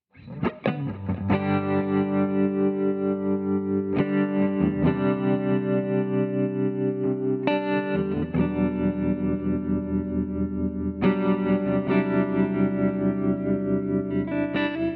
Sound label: tremolo